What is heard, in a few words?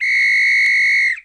whistle long